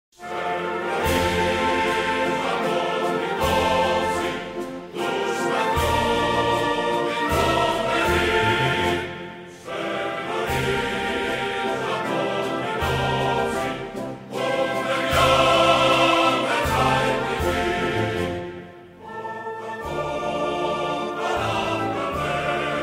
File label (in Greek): Εθνικός Ύμνος της Ελλάδος National Anthem of Greece